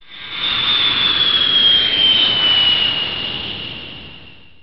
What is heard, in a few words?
swoop hit; Send Flying